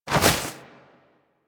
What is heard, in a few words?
Bird Of Prey Wing Flap Up; Warframe